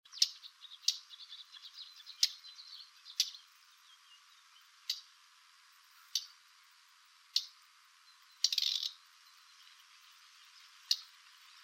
rousserolle verderolle, chant

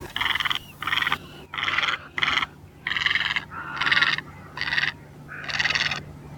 grande aigrette, chant

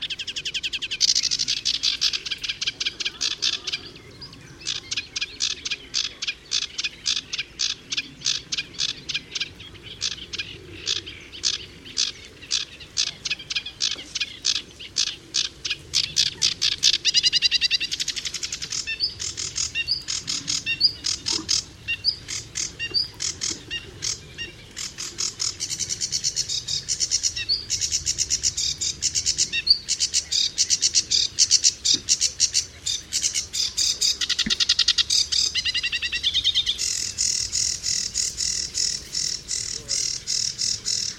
phragmite des joncs; chant

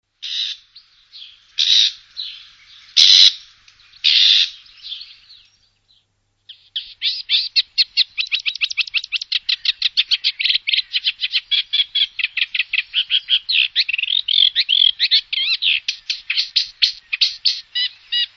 rousserolle effarvatte, oiseaux chant

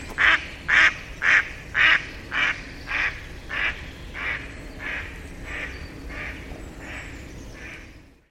canard colvert; chant

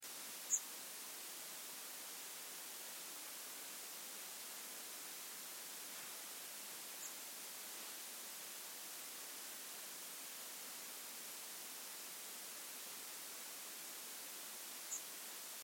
gobemouche gris, chant

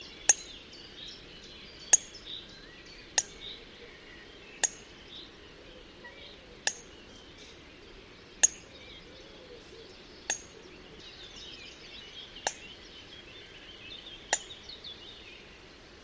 foulque macroule; chant

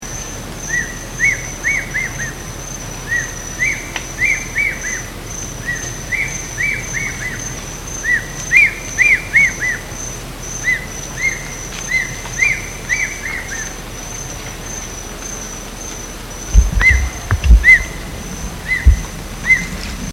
aigle orne, chant

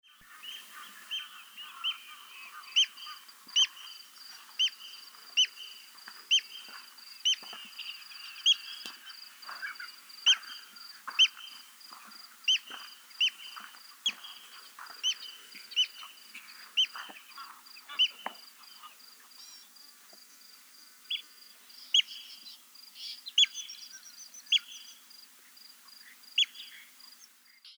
vanneau a tete blanche, chant

